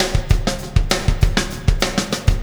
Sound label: Beat, Processed Drumkits; Sonic Foundry (Sony Creative Software); Processed Drumkits Zero Gravity Beats; Solar Flare Beat